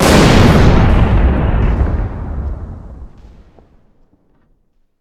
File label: destroy cube